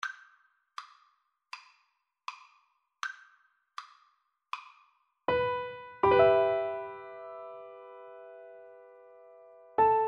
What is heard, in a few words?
piano duet